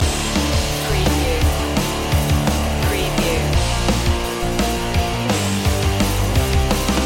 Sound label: 8bit rocktronic loop